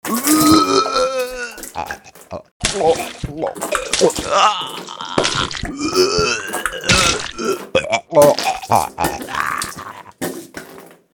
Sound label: sick sms